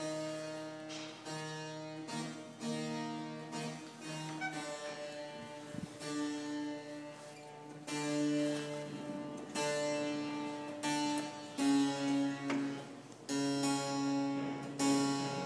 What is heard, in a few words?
tuning the harpsichord; saint magnus